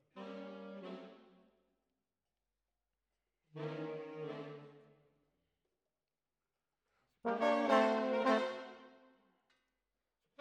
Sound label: Trombones, jazz for piano